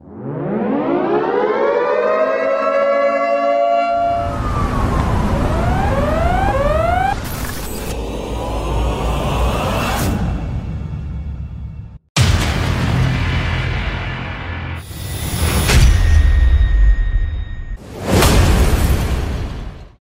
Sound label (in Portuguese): EFITOS IMPACTO; pack de tilhas e efeitos 2.0